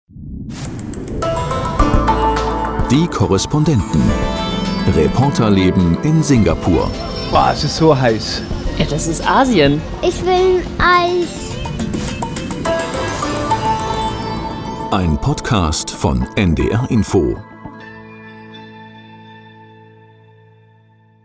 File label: Podcast Opener; NDR Info; Singapur